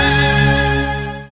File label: SYN, dance; Sound & Vision - Gigapack II CD 1